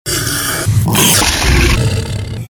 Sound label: danger growl